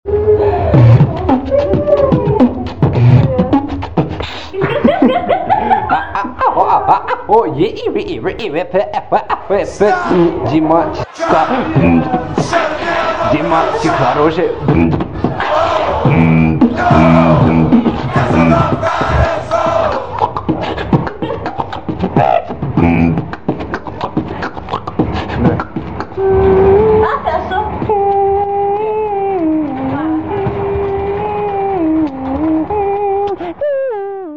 beat to the box live

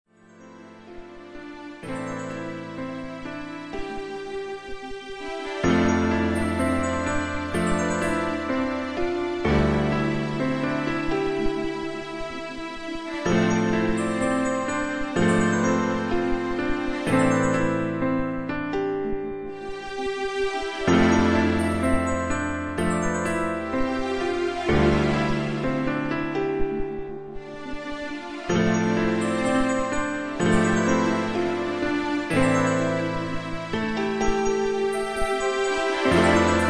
piano

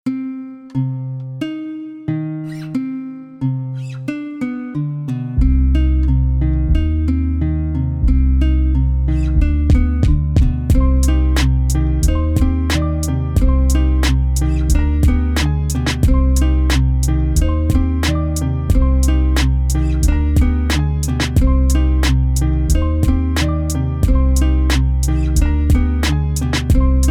guitar theme

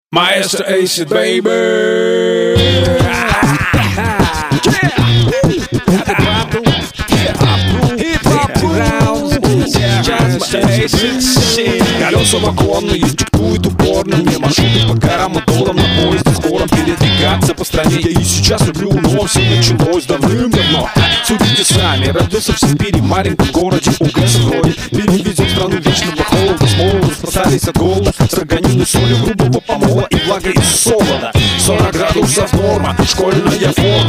beatboxing

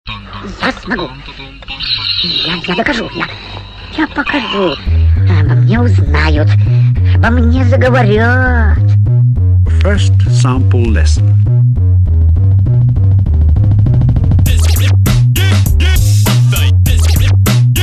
dj battle

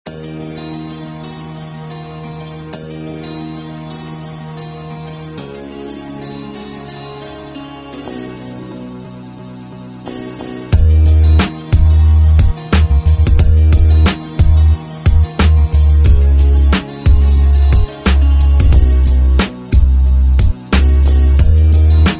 beat test

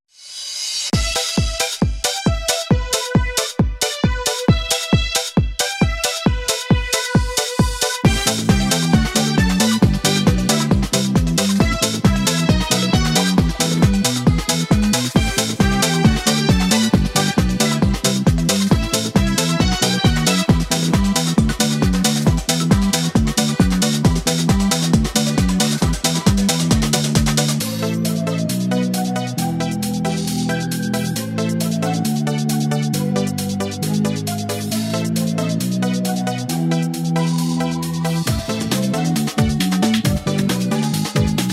karaoke

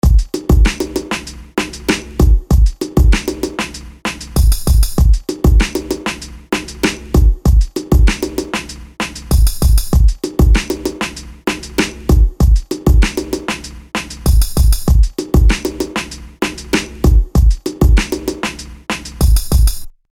beat

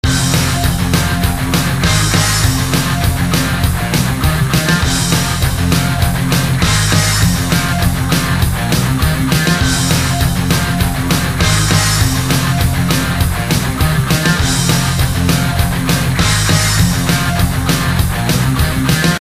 riff

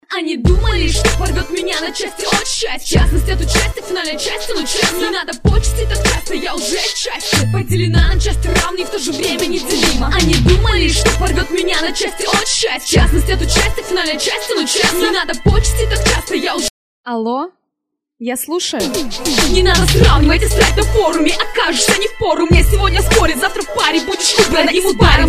female rap battle

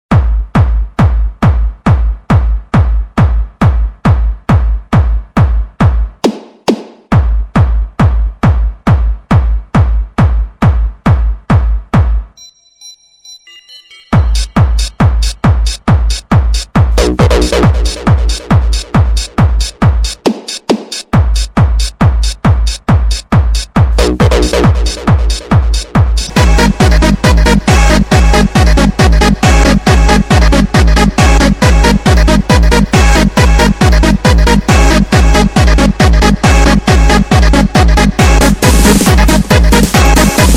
bass club mix

